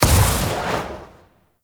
Vial Ability Fire Shatter; Warframe; Warframes; Alchemist; Elements

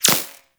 Laser Impact Default; Warframe; Weapons; Bullet Impacts

